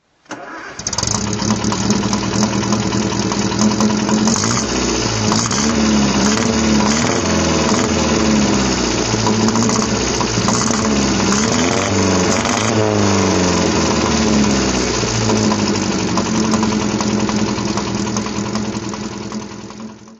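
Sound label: MG J2 throttle; MG J2 Midget, 1932; Motorgeluid; V3D/ ClassicarImages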